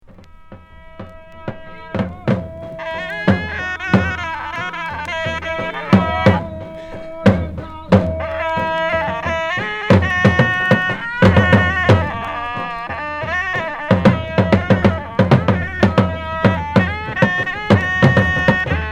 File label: drums and rhythms